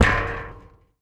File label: Street Ball Metal Rim, Street Basketball; SFX Kit